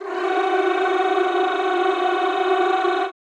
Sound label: CHO Rrrr F#3, Roland; Sound & Vision; Gigapack I CD 2; CHO Mmhh + Rrrr